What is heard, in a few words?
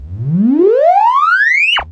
Electro FX; Ueb HE Electro FX